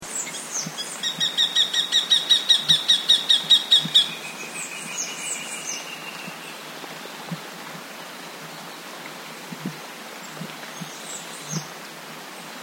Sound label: red stained woodpecker